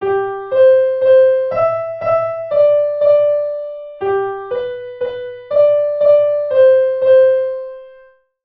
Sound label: Melodic Cadences